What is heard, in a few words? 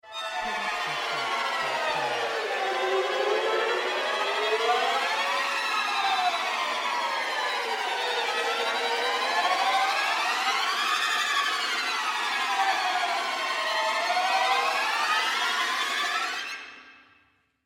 tremolo siren